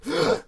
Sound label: gasp male